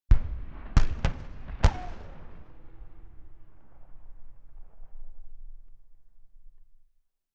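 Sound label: Warfare, Chieftain, 105mm Shell Fired; BBC Sound Effects Library; Explosions Guns Alarms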